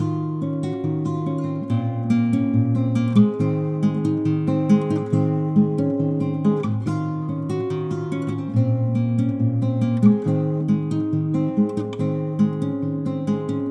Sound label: Nylon Guitar Loop